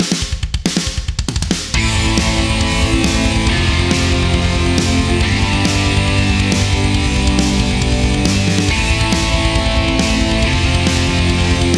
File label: metal